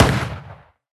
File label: Musketeer Shot; Linirea